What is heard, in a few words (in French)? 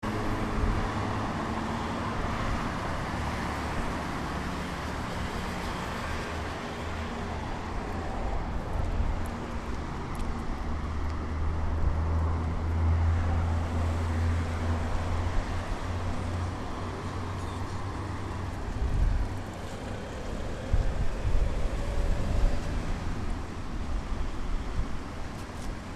circulation av Jean Mermoz